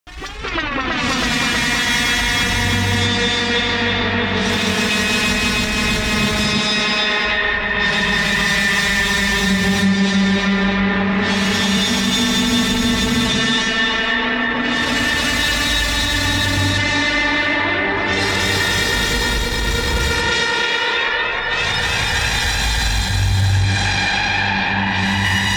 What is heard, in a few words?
purgatory